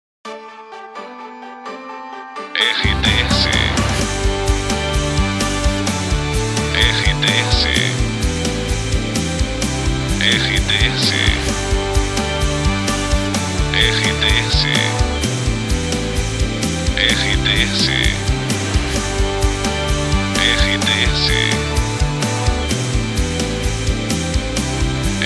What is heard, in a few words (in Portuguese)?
TRILHA PARA LOCUCAO; Aliança Web